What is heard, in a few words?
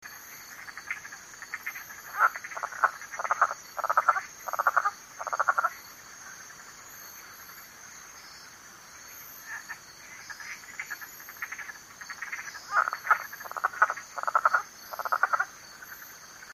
osteopilus brunneus barbecue bottom jamaica; West Indian